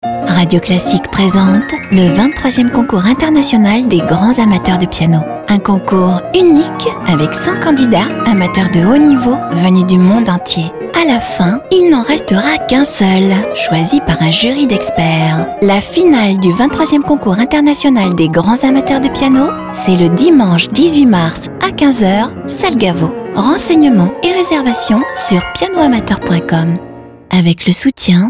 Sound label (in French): piano; les grands amateurs de piano